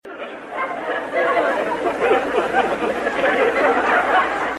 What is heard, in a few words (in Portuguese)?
risadas; chaves; risada de fundo